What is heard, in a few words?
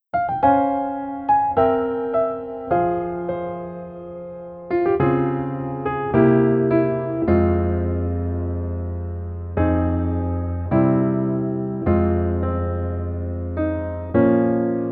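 Piano version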